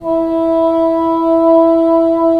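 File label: wind bassoon; LA Composer vol. 3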